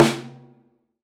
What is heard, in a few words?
Prepared Drums